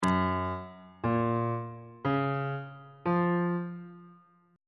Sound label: g flat major 7th chord 1 is g flat major 7th on bass clef